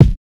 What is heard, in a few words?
Drum Kick